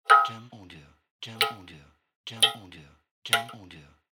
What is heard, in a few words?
Falling Drop